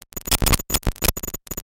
Soft electronic glitch

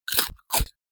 Cartoon eating potato crunch

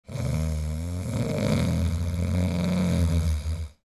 Deep heavy snoring long

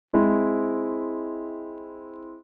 Old vintage cartoon disappointment

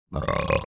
Human burping short and deep

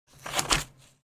Scissors cutting paper

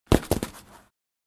Human body fall on concrete

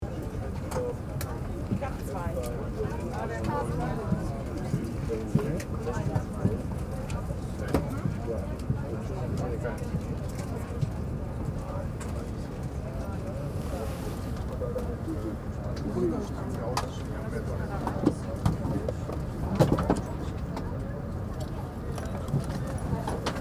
Airplane ambience interior before take off

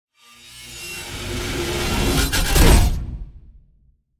Metal wires transitional impact

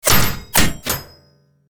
Tank armor hit by enemy fire

